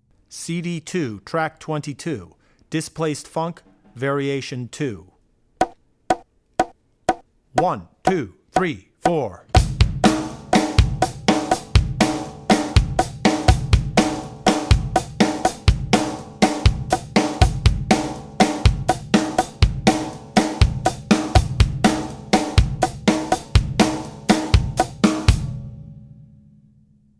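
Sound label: Funk - Displaced Funk Var, Drum Tracks; Displaced Funk (Variation 2); Drummer's Bible Tracks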